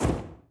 chase flap; fallen

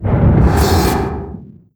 portal start

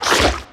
jump high; gish

